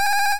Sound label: highscore